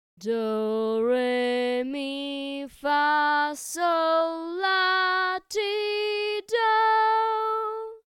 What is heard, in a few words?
Diatonic Solfa Scale Derived from Harmony